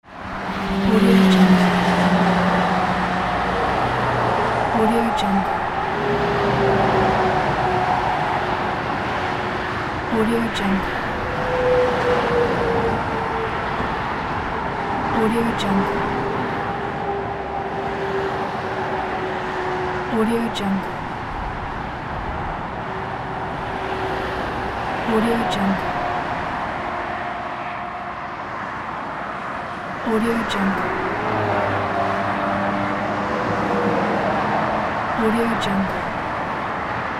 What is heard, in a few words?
highway traffic